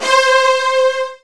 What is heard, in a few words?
Virtuoso Strings